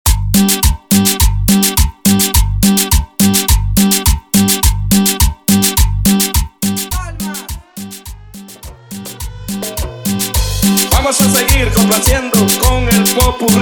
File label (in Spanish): Cumbia; remake edit